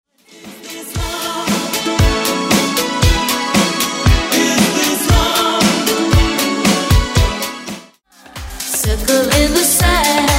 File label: 80s Remix Redrum; 10 Edits Pack